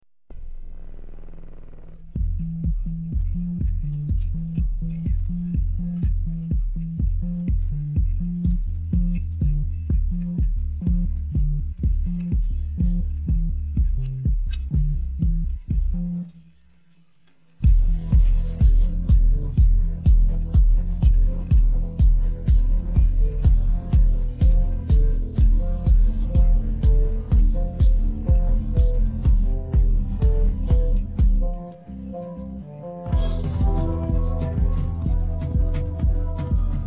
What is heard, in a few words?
no vocals; modern warfare 2019 the musical!!!-game version